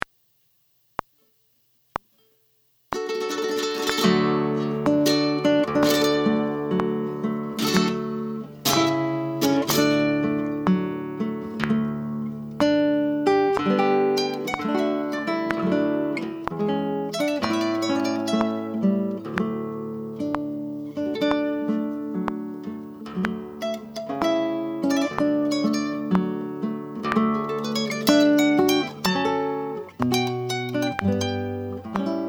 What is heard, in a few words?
Flamenco, Guitar